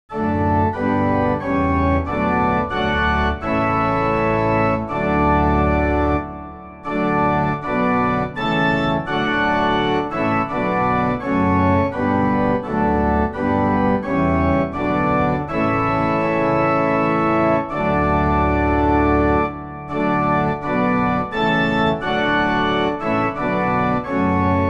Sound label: inst; Kirchenlieder; lobe den herren den maechtigen koenig